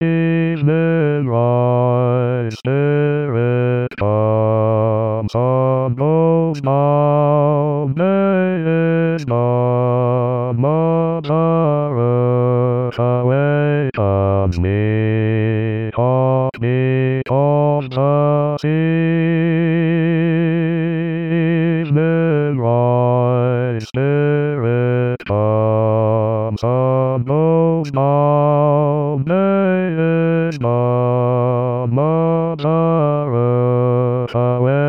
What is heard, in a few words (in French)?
Basse